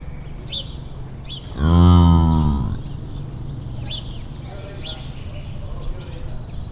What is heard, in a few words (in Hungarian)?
távoli beszéd is, kardszarvú antilop; debreceni zoo 2012; marantz puska